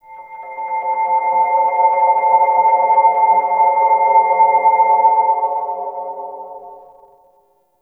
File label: Clan Dojo Research, Ambience; Warframe; Dojo Randoms